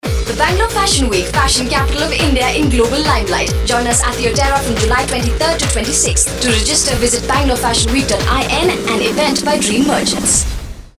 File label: Promo Radio Jingle